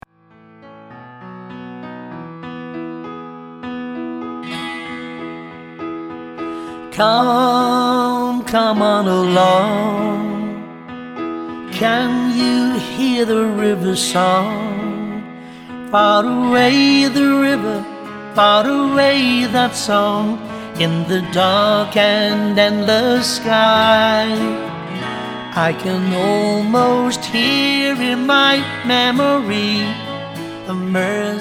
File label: Mersey Lullaby